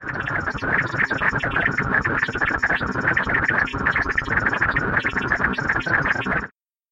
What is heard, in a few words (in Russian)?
elektronnye zvuki peredachi dannykh